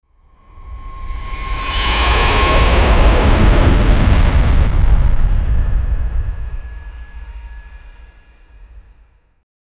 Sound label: rushing air impact sound; arrival